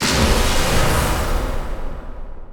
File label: lifedrain cast; weapons; dota; pugna